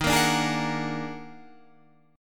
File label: Eb Minor Major 11th, Guitar, Standard; EbmM11; strummed; JGuitar